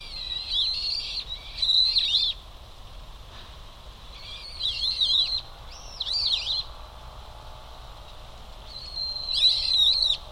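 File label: bird, Brown-eared bulbul; hiyodori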